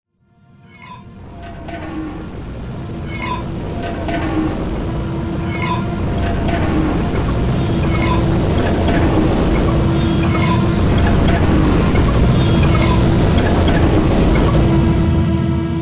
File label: boss start; cry of fear